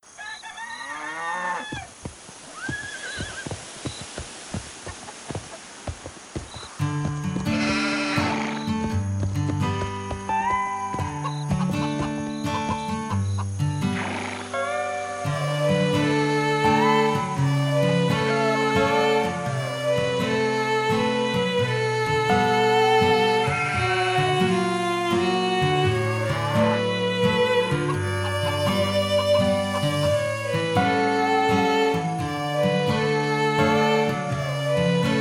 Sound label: Soundscape Version; Lon Lon Ranch; The Legend of Zelda Ocarina of Time